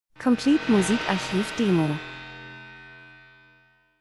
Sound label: transition; Transition & Movement - unangenehm tief dunkel düster